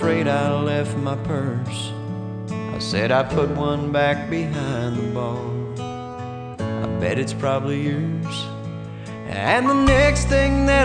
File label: country